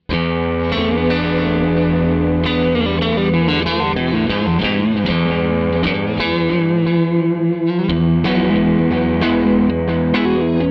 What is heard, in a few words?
Bassman Delay after amp